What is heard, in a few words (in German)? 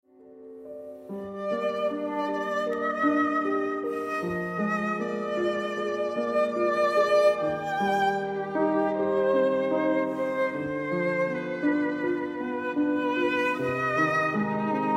Adagio in F fuer Violine und Klavier; Instrumentalkompositionen